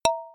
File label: Ui Mistake